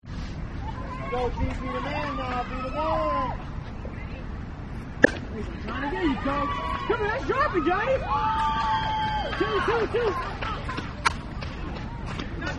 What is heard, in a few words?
softball; usa 2000